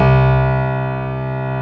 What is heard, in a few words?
KLAVINS C2; East West - Global Sounds